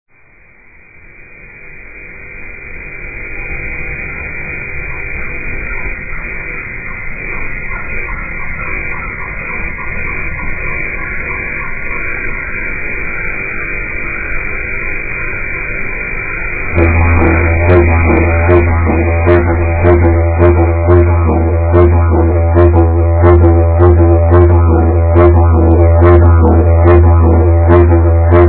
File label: didjeridu